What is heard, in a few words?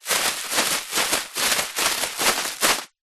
office bubble wrap sheet shake